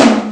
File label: drum; Amiga Soundtracker Sample Packs